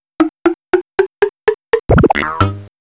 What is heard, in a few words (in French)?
Monter à la corde